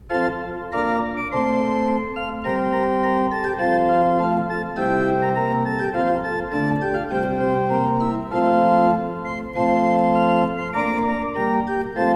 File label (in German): gebraucht orgel; Kemper